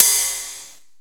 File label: ride cym; drumware